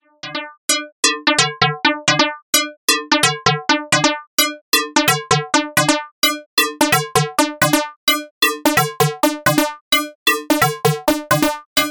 ALM Busy Circuits Motto Akemie Ratio Sequence Feedback CTRL FM Mode